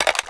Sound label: awp clip in, weapons